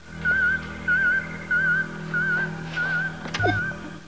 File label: alarm